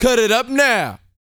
VOCAL F; ILIO

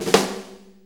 6-8 Snare Flam; 6-8 Loops; Flams; Drum Tools

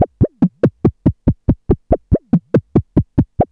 MACHINE BEAT; Zero-G Ambient 1

